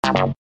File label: Fail